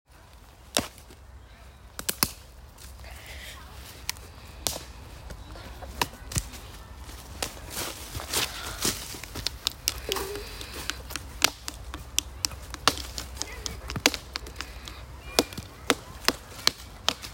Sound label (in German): wildschwein specht; waldnasen geraeuschesafari